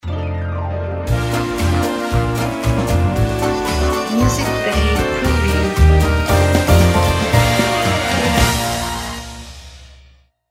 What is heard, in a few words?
Award Ceremony